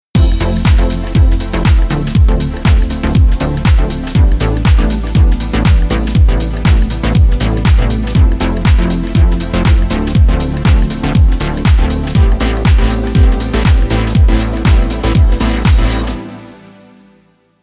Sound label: Synth Side Chain Soft